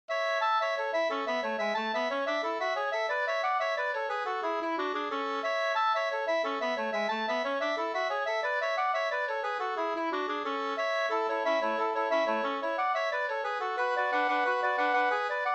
engelska; fr Lilla Edet

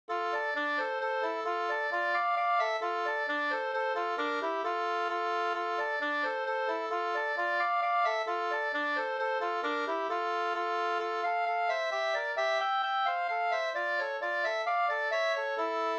polska Slangdans

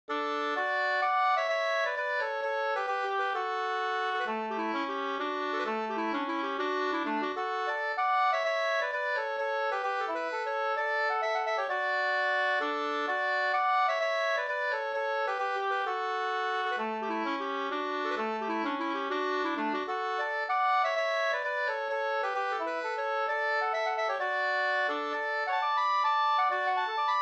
polska Systerpolska; Orsa Da

